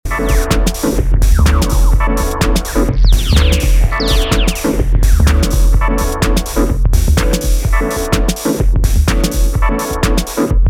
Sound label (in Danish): tromme rytmisk bass mix